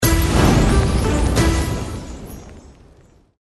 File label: Jackpot Animation